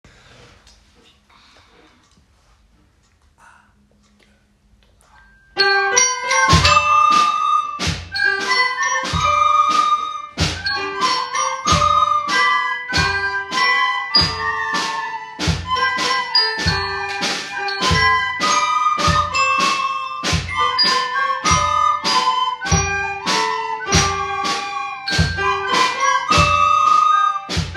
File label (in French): L'orchestre; ecole sgv